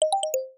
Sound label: notification Leave